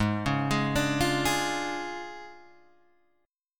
D Minor Major 7th Flat 5th, Ab

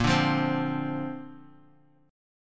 A sharp Major Add 9th, down

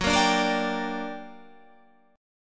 G Minor Major 7th, Gb, guitar chord

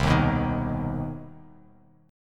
C Minor Major 7th down, Bass Standard, chord; strummed; JGuitar

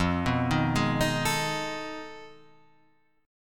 F 7th Sharp 9th Flat 5th; F7#9b5 arpeggiated; x,x,3,4,3,3,5,6

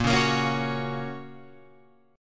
Bb Minor Sharp 5th, down, Guitar, Open F; strummed; JGuitar

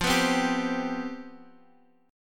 C Major 7th Suspended 4th, F, guitar chord

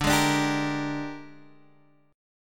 A sharp 13th

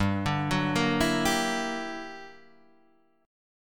G Minor Major 13th, G; GmM13 arpeggiated; JGuitar

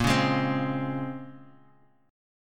Bb Minor 9th, Guitar, Open G

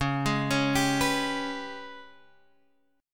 Ab Minor Major 7th Double Flat 5th

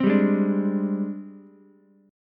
G sharp Major 7th Flat 5th Ukulele; 5,7,4,5; JGuitar

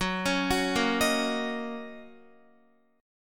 Fsharp 6th down Guitar; F#6; arpeggiated

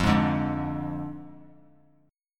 F Minor 6th F down; Fm6; strummed; JGuitar

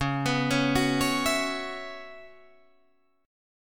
C sharp Minor 11th C sharp, Guitar Drop D

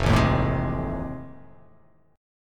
F 7th Sharp 9th

